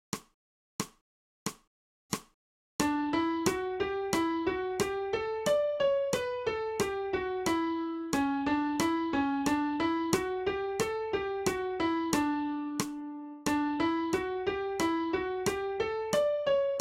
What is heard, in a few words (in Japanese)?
新フレットレス